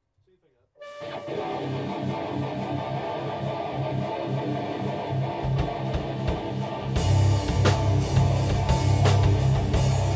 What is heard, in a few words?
new riff